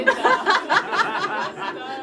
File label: Laugh